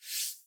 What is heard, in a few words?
global delete, ui; Vivo T1 Pro 5G